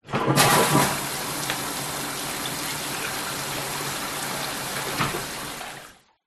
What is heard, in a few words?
public places public toilet urinal flush metal